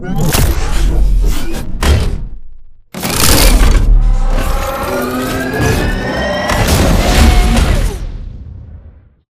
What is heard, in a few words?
lob end; Titanfall 2